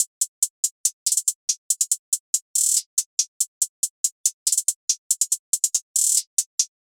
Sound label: Hihat Loop 140 BPM; Chief; Cymatics; Fiji Hihat Loops & Percussion